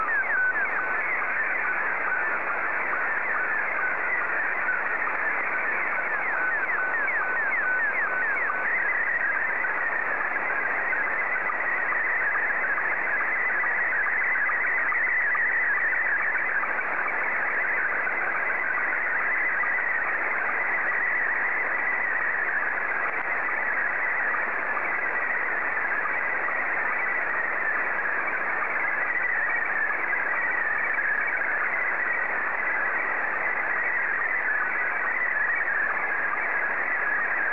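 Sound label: SSTV, Scottie 2; JA3QJQ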